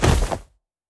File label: Fall Land, Steps; Malbers Animations